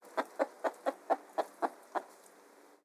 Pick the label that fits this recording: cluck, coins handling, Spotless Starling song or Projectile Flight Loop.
cluck